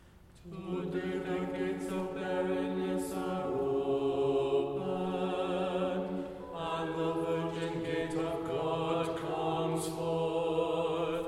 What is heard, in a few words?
Tone6 Common Chant Sticheron Example G minor Bass